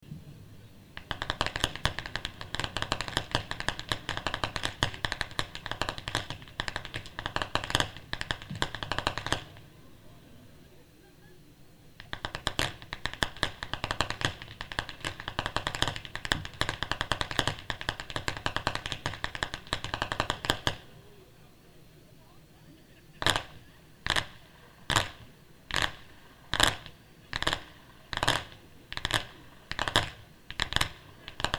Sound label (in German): schnalzen; wiederlohen; saaldorf